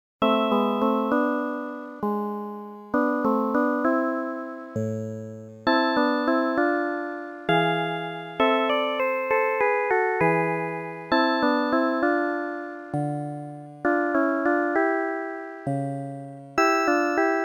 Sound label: Saraband; Dragon Quest Game Music Super Collection Vol.1